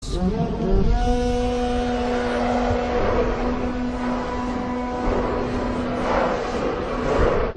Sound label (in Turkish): takip sesi